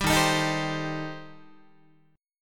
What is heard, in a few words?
D sharp 6th Flat 5th, D sharp, down, Guitar